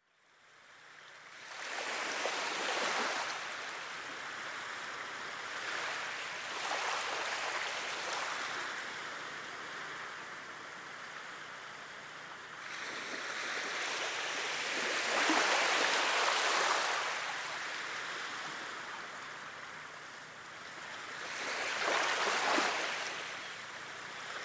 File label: sea